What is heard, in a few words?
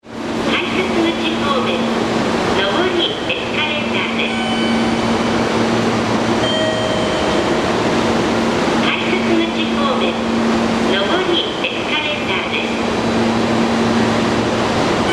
station; 栄町駅階段誘導チャイム; 瀬戸電